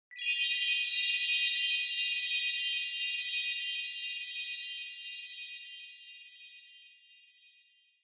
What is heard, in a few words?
Onoma Sparkle Low Long; オノマトペ キラキラ; 低 長